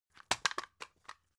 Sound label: drug package drop; arzt